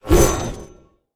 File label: sickle swing; smite; osiris